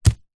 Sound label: stone med drop on dirt; zapsplat; foley